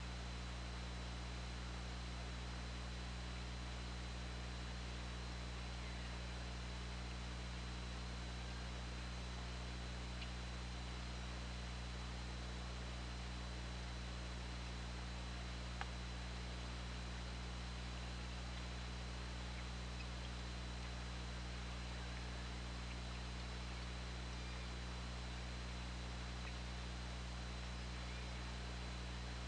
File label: OrcaLab